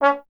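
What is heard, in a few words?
Brass Trombone C#0; Miroslav Vitous - Solo Instruments VOL-1; 90 s Sample CDs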